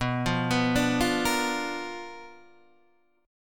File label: B Minor Major 7th Flat 5th, Guitar, Open D